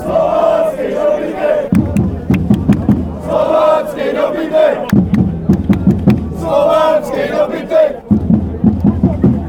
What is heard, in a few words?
bohemians chant, fan chants